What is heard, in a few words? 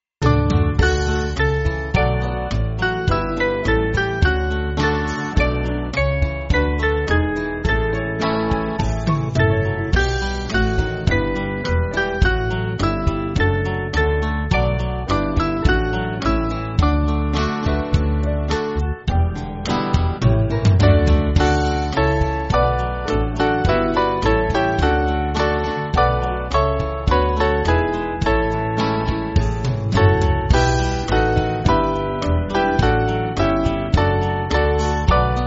Lamp Of Our Feet, band; Epworth